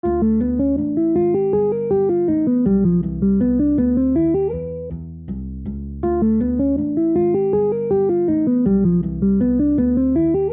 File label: major 251